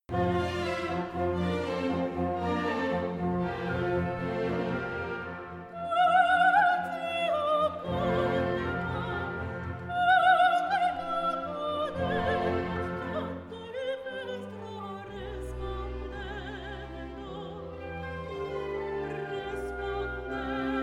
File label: Motet K165